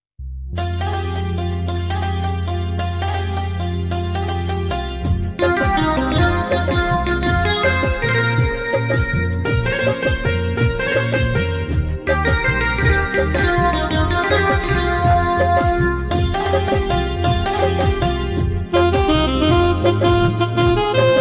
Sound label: romantic ringtone hindi ringtone love story ringtone